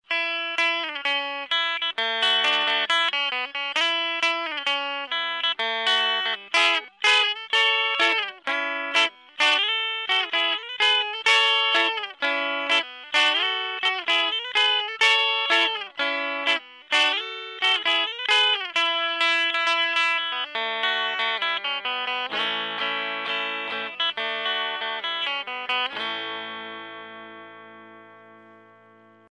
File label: Trebler